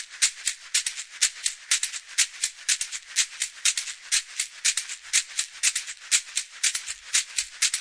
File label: loose kashishi, drum loops and breaks